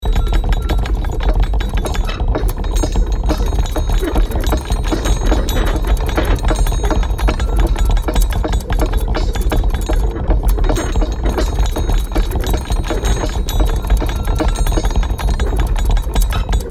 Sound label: Machinery gears rattle loop; gfxsounds